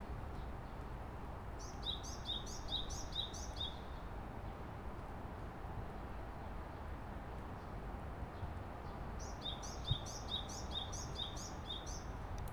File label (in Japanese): 鳥の声